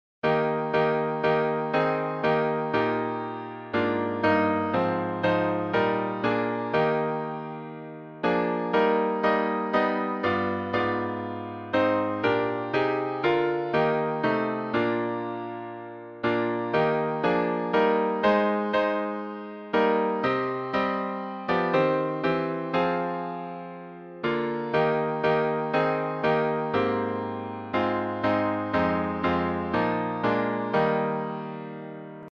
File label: Hymns